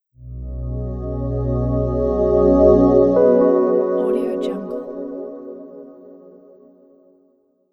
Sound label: short logo reveal calm warm atmospheric; mylesproductions